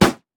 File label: jack in the box basic atta; jester